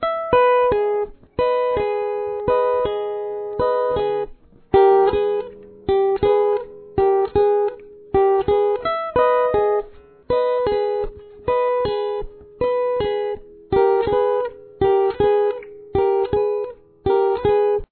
outro fill

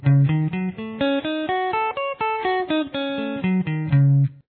Arpeggio Cm6 style, guitar

